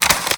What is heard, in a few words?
Button Play Ffwd Rwd